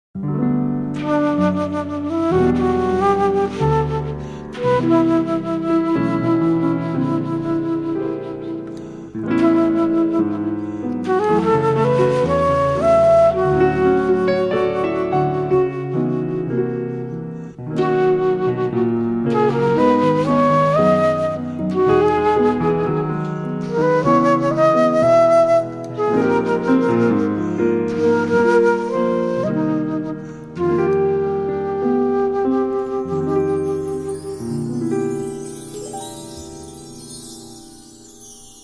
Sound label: Samba